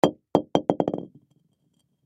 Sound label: Bowling Ball Drop, Bowling Ball Drop Bounce Roll Cement; SPORTS; Bowling (Sound Effects:Sports); Blastwave